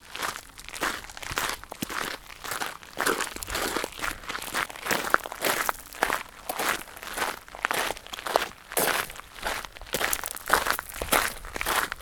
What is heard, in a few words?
Walking Gravel